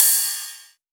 Ride, Cymbals

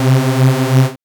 BLAST C2; dubstep; Jack Sparrow; Deep Dubstep Progressions

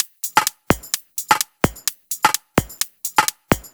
Loop, 128 BPM; Mutekki Media; Vengeance Electroshock Vol.2

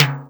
Toms

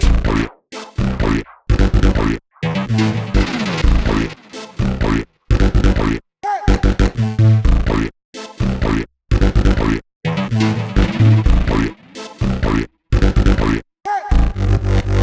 Biomass B#m Full Mix, Complex Electro Synths; Prime Loops

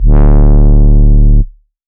Bass Shot D; Mutekki Media; Vengeance Electroshock Vol.2; Bass Shots

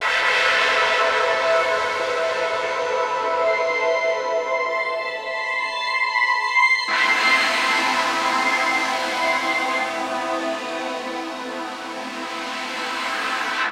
Bpm140 Spring Pad Loop Am, Dubstep

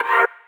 Bb STAB; Loopmasters; Funky Underground Vol 2

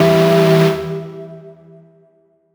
Synth Shot E

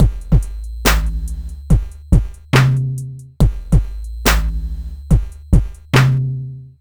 Drumroom Break, Bpm 141, Dubstep, Drum Loops

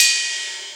Ride, Dubstep One-Shot Drums

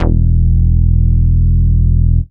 Bass Shot E; Mutekki Media; Vengeance Electroshock Vol.2; Bass Shots

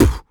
Human Beatbox, Beatbox Hits; Loopmasters; Vocal Hits And Kits; Human Beatbox 1

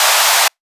FX Misc; Mutekki Media; Vengeance Electroshock Vol.2

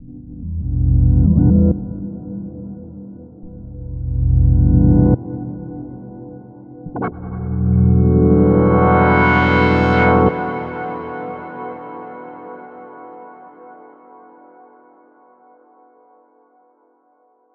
Bpm140 Pad Loop E, Dubstep; Airy; Oreol; Prime Loops; Dubstep Illusions

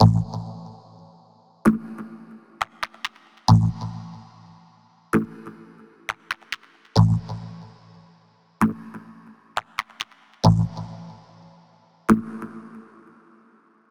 Bpm138 Perc Loop, Dubstep; Dark Matter

